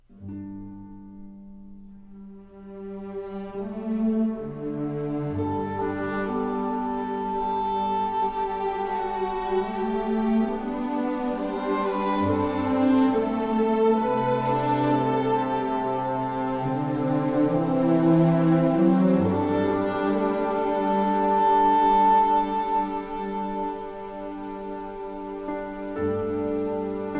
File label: piano lyrical